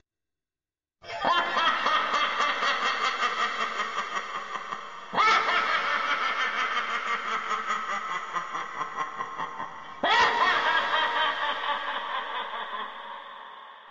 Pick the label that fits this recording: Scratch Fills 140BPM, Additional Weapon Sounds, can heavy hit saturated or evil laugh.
evil laugh